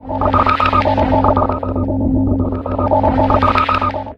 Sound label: genetics, Machines